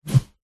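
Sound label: large stick bamboo swing swipe whoosh air; zapsplat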